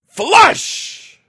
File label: flush